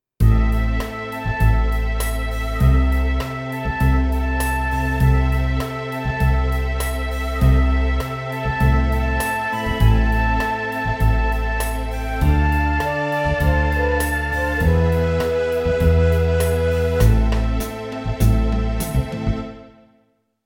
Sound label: New Melody Oboe, Chor